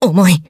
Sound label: Taking Damage; BBCF; Hibiki Kohaku